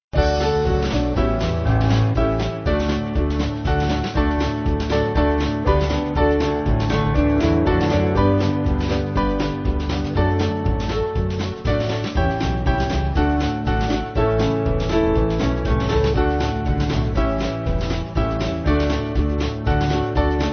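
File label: Band, Music Backing Files for Churches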